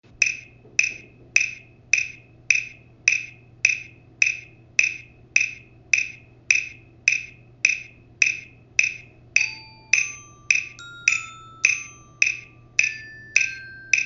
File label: bells; harry potter